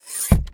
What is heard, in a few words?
Door Balcony Close; Among Us